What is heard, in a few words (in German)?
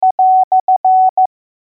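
Anführungszeichen, morse alphabet; morsen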